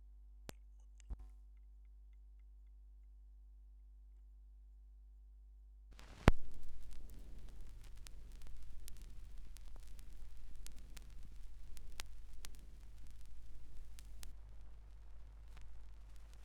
schallplattengeraeusche

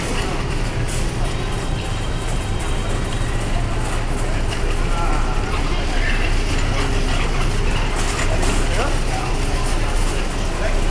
supermarkt; Fieldrecordings

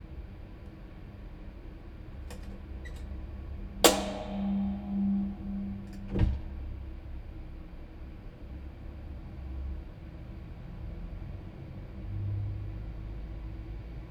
Aufnahme 12 Kanal Fieldrecording; live mit Vocoder Mix; Das kleine Regen Rhythmus Orchester

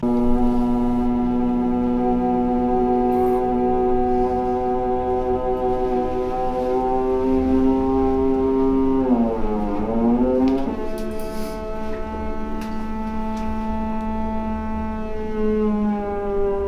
Dusche; Fieldrecordings; Brasilien; Bertonis Dusche

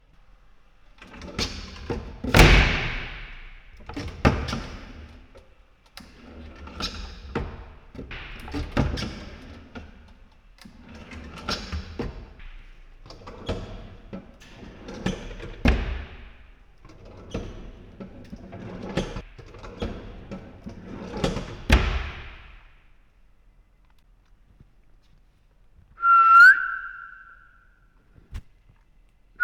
In Der Halle; Fieldrecordings; Cafe in Lissabon

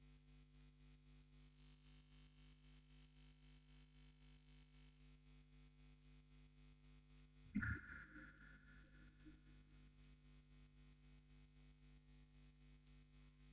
Aufnahme Vocoder, Spieluhren-Quartett

